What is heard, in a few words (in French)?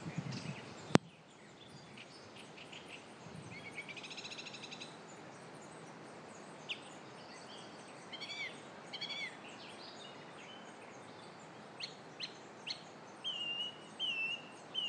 chant d oiseau